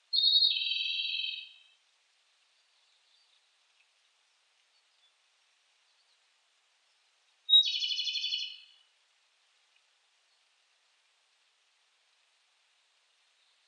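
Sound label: Japanese robin; komadori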